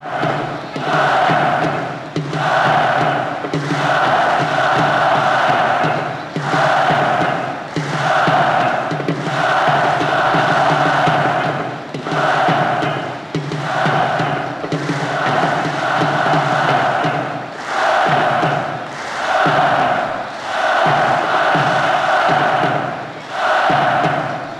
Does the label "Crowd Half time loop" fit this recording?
Yes